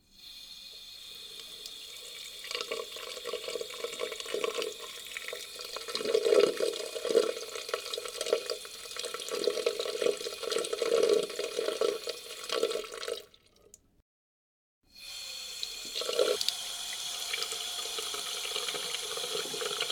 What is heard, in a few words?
sink; liquid; NS Studios - ultra sound collection